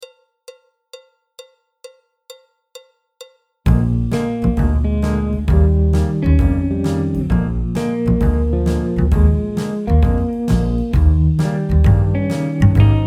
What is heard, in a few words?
Sidlin' Sam BT, alto, tenor; Jazz Syllabus Level 1